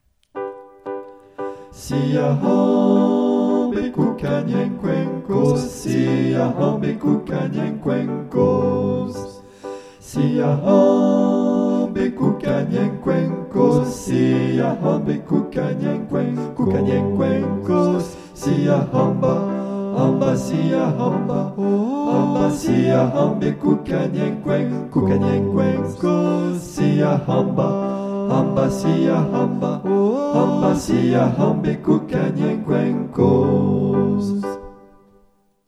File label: siyahanba tous, chants; siyahamba (tous)